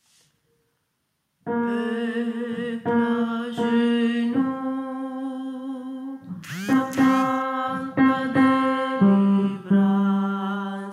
Cantique de Noel tenori; natale

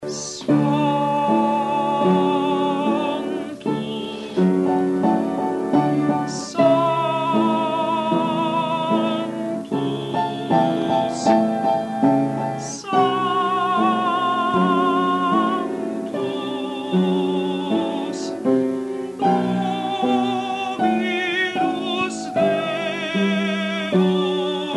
Sanctus soprani; Mozart

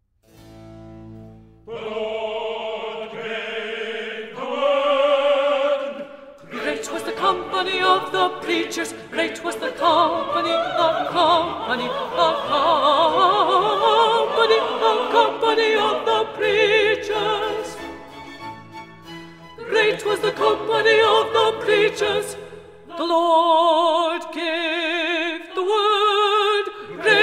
contralti; The Lord gave the Word; Messiah; handel messiah

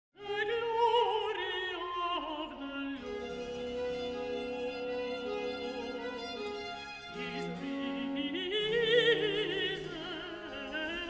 contralti; O thou that tallest; Messiah; handel messiah

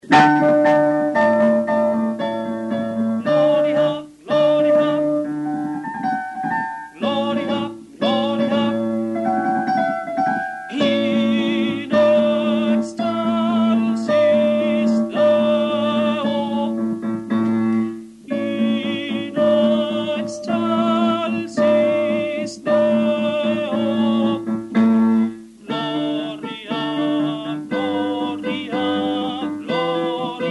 Gloria in excelsis Deo Soprani; Vivaldi

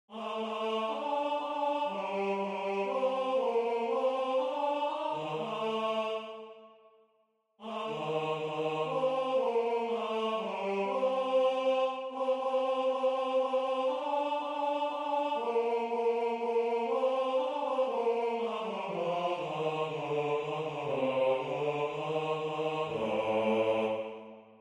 Fermarono i cieli bassi; Santucci; natale

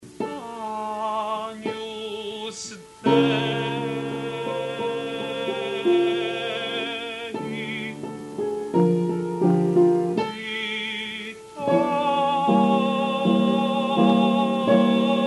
Agnus Dei soprani; Mozart

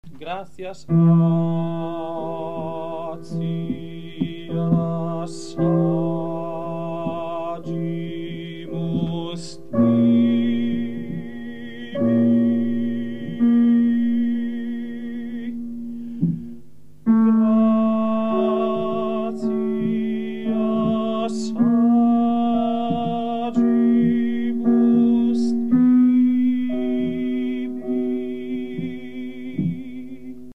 Gratias agimus Tibi Bassi; Vivaldi; Gloria

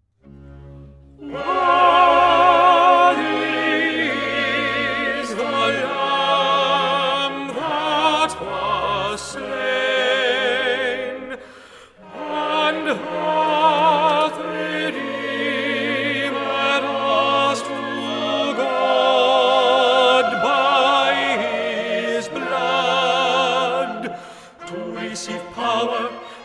tenori; Worthy is the Lamb that was slain; Messiah; handel messiah

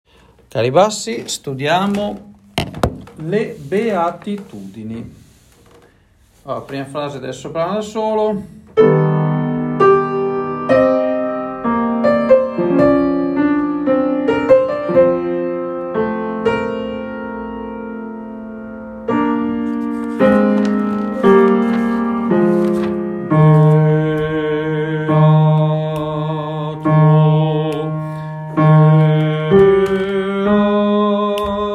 Beatitudini Bassi; Santucci

